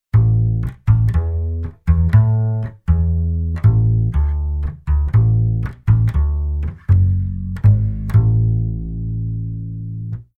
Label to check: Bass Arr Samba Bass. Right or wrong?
Right